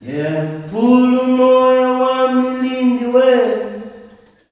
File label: "YEAH-KULU", MALE; Spectrasonics; Heart of Africa 2; S.MALEAMB